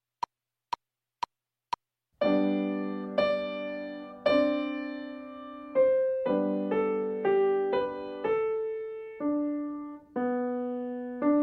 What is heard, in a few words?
Piano